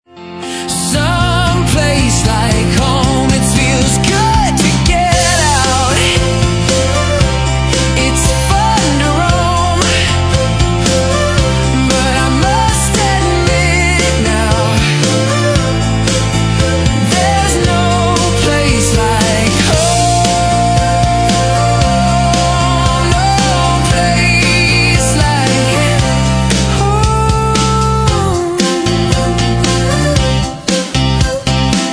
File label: Piano Pop Rock